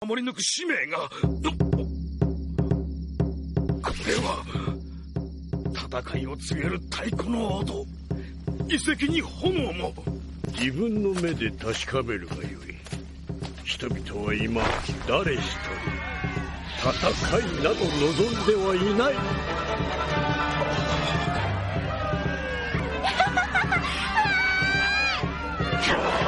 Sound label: Ringtone; One Piece